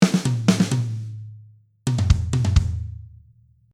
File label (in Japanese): drumつなげる